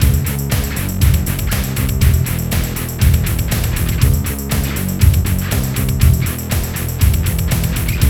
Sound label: FAT ROCK